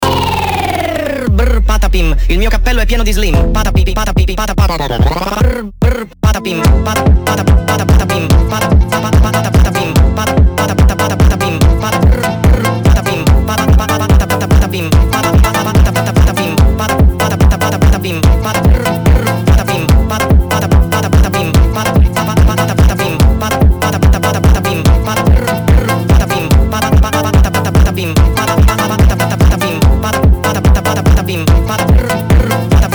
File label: FUNK Sped Up